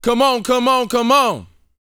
VOCAL F; ILIO

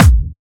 Trance Kicks, Bassdrums

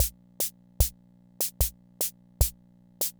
Kent K-220 Rhythm System Loop, drum machine

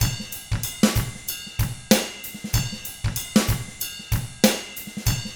95 FUNK, Drums; ILIO; Double Platinum Drums 1

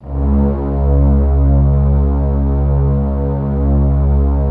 LOW C#2, SFT LOW VLNS; Q Up Arts; Denny Jaeger Master Violin Library

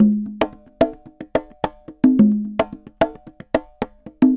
Congas Murga 110 bpm, Drums & Percussion; Best Service; Festa Latina VOL-1

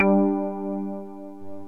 PERC 5ths

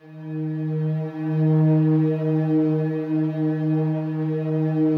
SLOW STRINGS 2 D#2 L, Yamaha VP-1; Music Store; 90's Sample CDs

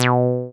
SYN 303, TB-303; TB303+MC202; Sound & Vision - Gigapack I CD 2

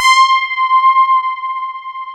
DS8 C 6, Korg; Back In Time Records; Korg Universe VOL-1

